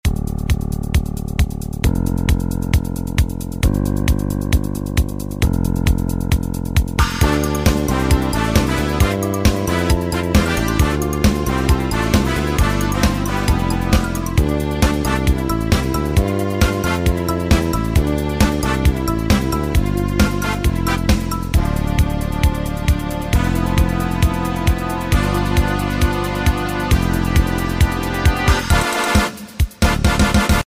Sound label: fight